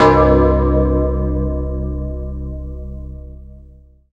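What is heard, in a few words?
synthesizer clear, digital; Sound & Vision - Gigapack I CD 2 (Roland)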